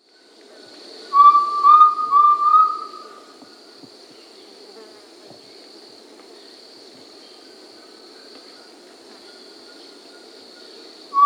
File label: Undulated Tinamou (Crypturellus undulatus), Peru; S. America